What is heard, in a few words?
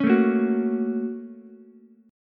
Bb Minor Major 7th Sharp 5th Bb down Ukulele; BbmM7#5; strummed; JGuitar